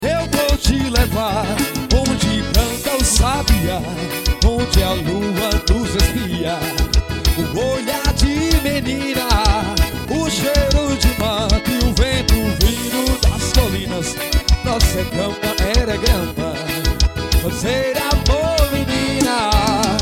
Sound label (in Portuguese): pouporri xote